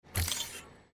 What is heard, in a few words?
add marker